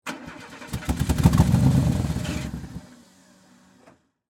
Car Fail To Start, Transportation, Automobile, Car, Fail, To, Start, Dead; Cars; Blast Wave